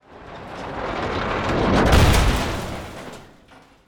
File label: heavy metal crash, heavy metal machinery crash